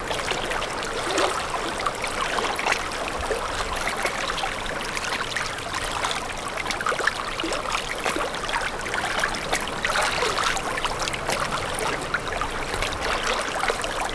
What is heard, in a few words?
water lap loop, ambience; hl2 ep2; ambient